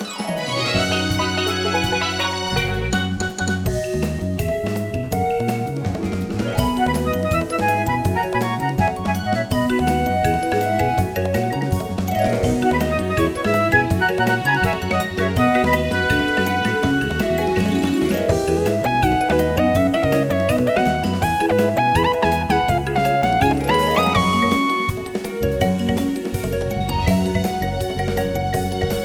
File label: Water Park Final Lap, Underwater; Mario Kart 8